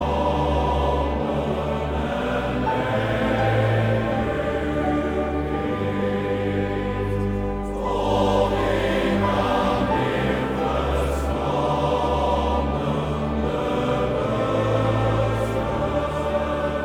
Koor mannen

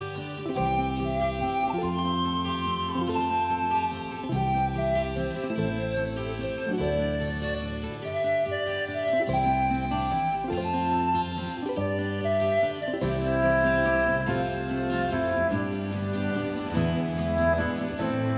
fluit

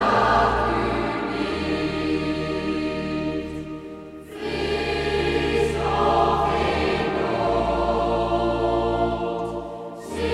koor dames

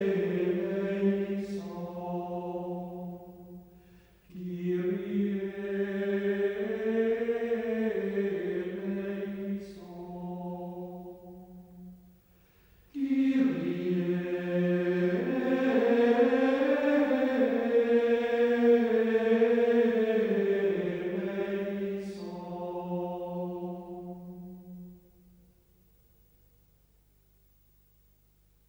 Koor (mannen) Gregoriaans; Gregoriaans abdij v Grimbergen; Kyrie eleison

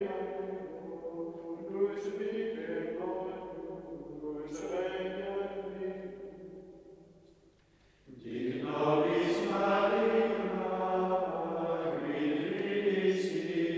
Koor mannen Benedictijner monnikken; van Clervaux

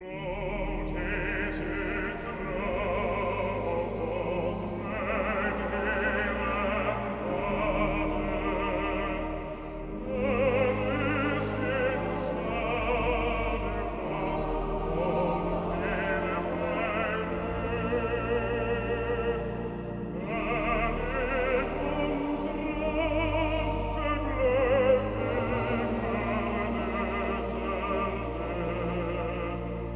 Koor gemengd met solist man

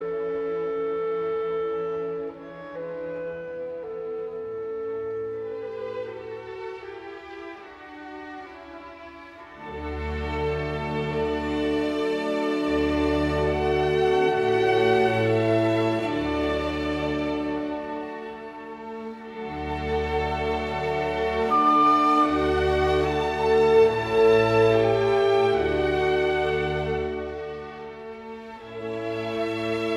Adagio in A, klarinet; Mozart; KV622

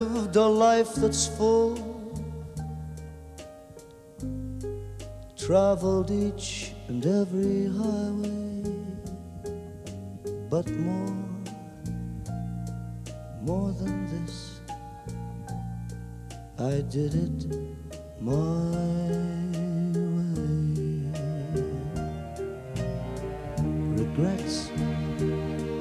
live applaus

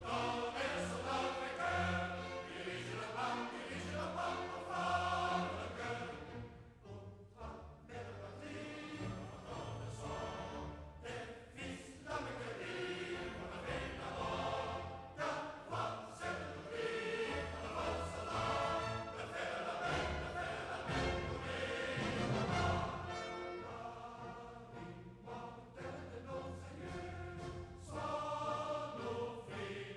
Koor mannen Soldatenkoor; Mastreechter Staar; uit Faust

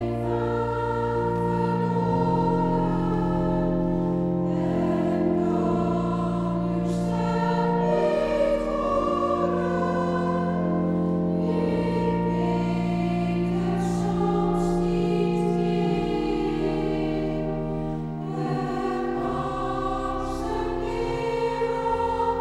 Koor gemengd; Gr Evangelisatiekoor Amersfoort

